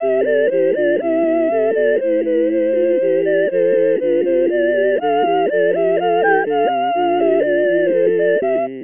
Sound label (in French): chant